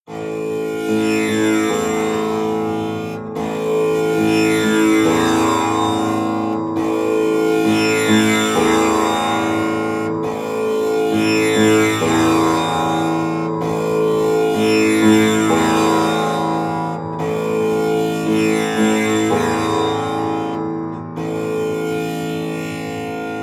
kotamo tambura sound; loops

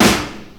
subtle reverb snare one shot g key

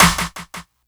rich top end snare one shot f key; Duration 0:01; Samplerate 44100 Hz; Bitdepth 24; snare drum samples

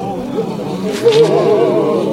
SHUDDERING

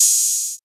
tamed open high hat sound g sharp key; open hat samples

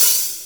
clicky open hat one shot f key

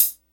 crispy hat sample g sharp key, pedal hi-hat

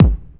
sharp bass drum sample g sharp key

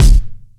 subtle reverb kick G key

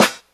good snare single hit g sharp key

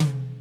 sharp tom sound D key

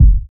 nice steel kick drum f sharp key; kick drum samples

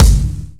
shiny kick one shot g key

